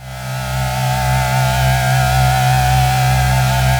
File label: Moog, Moog modular; Greytsounds VOL-1